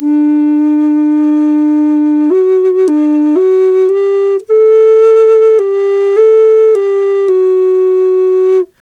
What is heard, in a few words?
D2 108, Didgeridoo